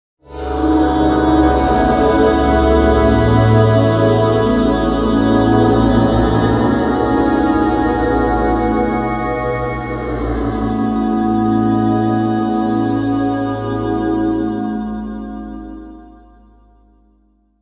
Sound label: Bed Airy Vocal Synth Shine; 5.1 STEREO MIX; Blastwave